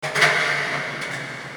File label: clang rhythm, ambience